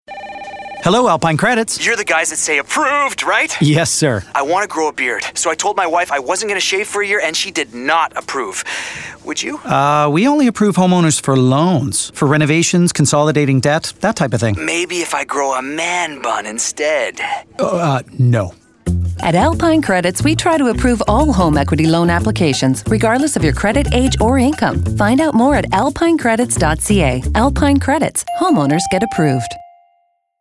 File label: music; Alpine Credits "Beard"